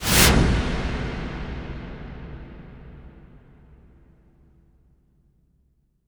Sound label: IMP WIPE, Cinematic Impact